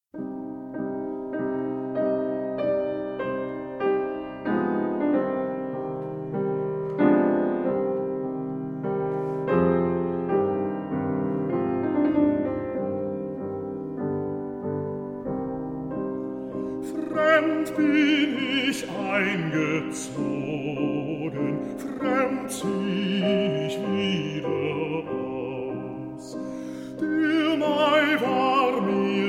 lied

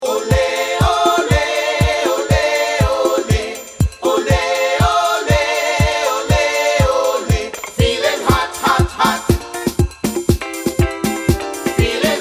syllabic

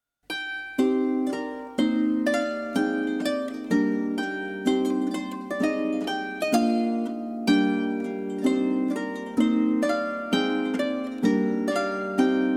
scotch snap

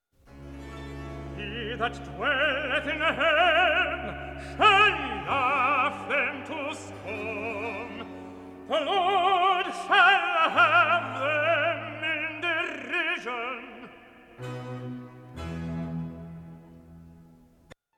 recitative

